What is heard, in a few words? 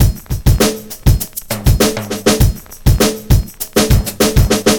133 bpm drum groove g key, Free breakbeat - kick tuned to the G note. Loudest frequency: 2184Hz; drum loop